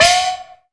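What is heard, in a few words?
chinese cymbal high; AKAI S6000 CD-ROM - Volume 5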